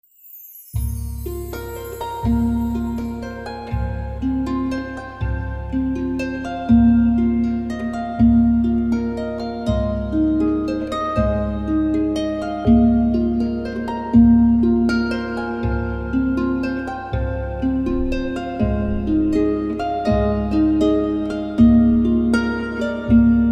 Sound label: Harp version